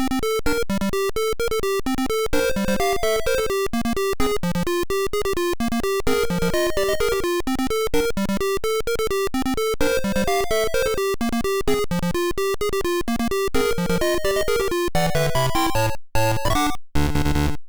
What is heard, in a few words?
Power Up~Clear; bomberman the music